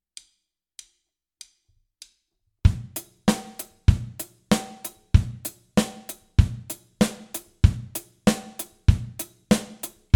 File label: grooves